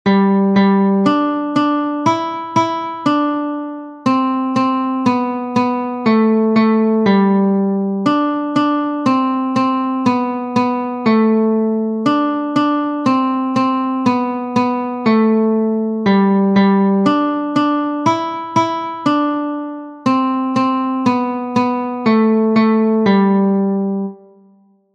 Twinkle Twinkle Little Star Guitar Several Strings